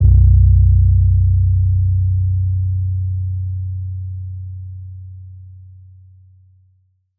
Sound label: Bass Guitar Shot - B; Lofi; Cymatics; Lofi Starter Pack